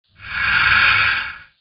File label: cars pass